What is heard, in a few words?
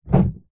pen ball point write single stroke on empty card box; office